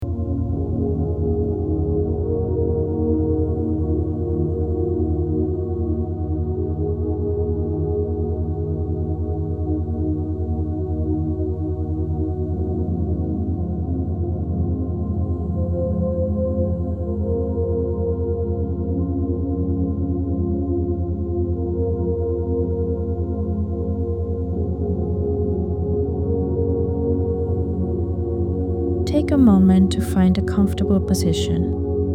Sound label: Theta Exploration The Mystical Garden Music